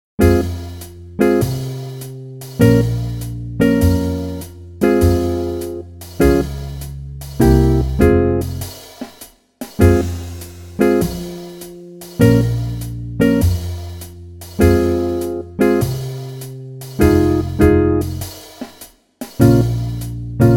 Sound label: Eb More tied notes, brass